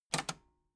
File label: metal button cassette rewind fast